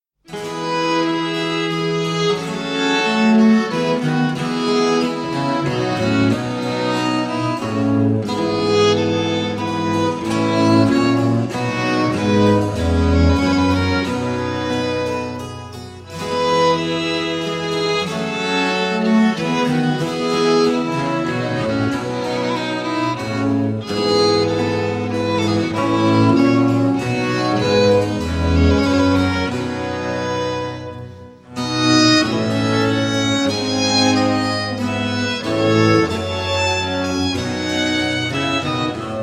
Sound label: Sarabanda, spoken; Mensa sonora Pars II (C 70); Simplicissimus Ensemble